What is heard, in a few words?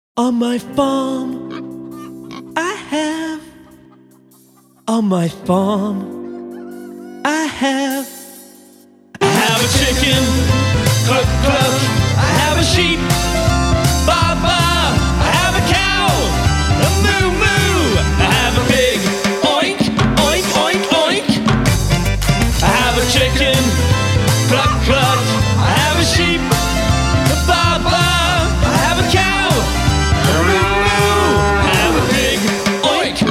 Animal Voices Farm